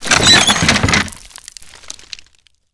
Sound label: shutter detail; favela; wd shutter; Call of Duty Modern Warfare